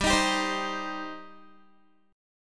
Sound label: G Suspended 4th Sharp 5th G down Guitar; strummed; 4E,4A,5D,5G,5C,6F